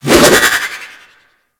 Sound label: Pet Flip; Alad V; Cinematic